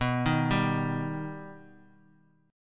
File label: B Augmented B down; arpeggiated; JGuitar